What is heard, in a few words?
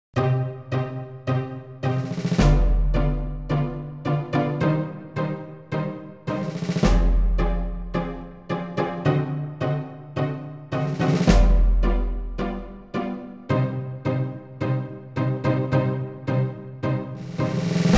Canasta tango